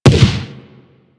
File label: Shrapnel Shotgun Fire; Railjack